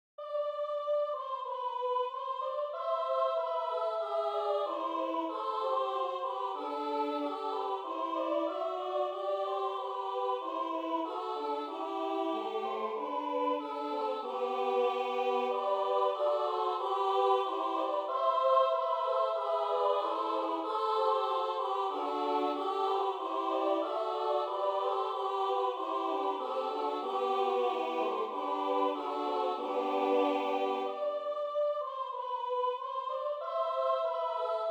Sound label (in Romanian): Sus boieri nu mai dormiti, Ardeal, colinde